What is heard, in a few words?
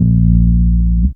BAS TON; E-Lab; X Static Goldmine 2; akai